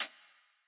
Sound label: hihat, japanese pop